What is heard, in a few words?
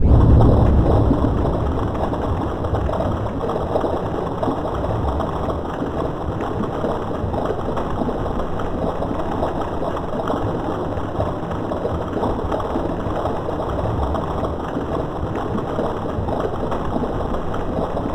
UNDWATER, Anime Sound FX, NIGHTMARE; AKAI Anime Sound FX